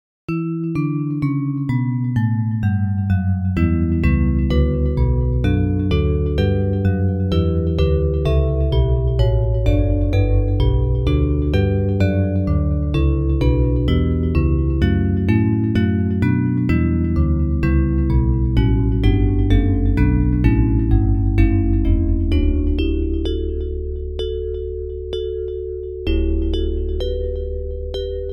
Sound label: Bells; Hymns